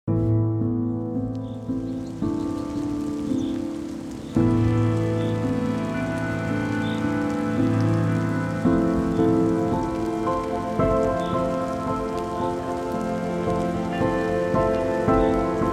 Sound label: Piano For A Rainy Day; aram